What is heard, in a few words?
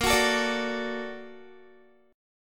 Bb Augmented Major 7th Bb down Guitar; Bb+M7; strummed; 4E,4A,5D,5G,5C,6F